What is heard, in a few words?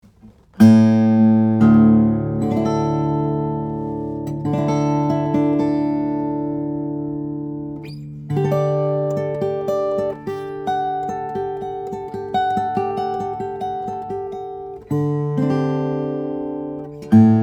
guitars; Circa 000 cutaway; mahogany; spruce; flattop